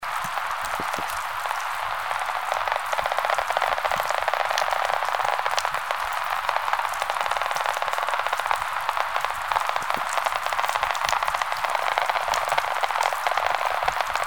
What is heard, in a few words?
Underwater Sound Astrolabe Dusk